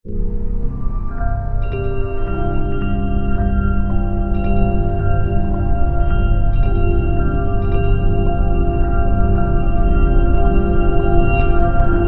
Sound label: Synth, Stab, Drone, Horror, 5.1; Chimes, Creepy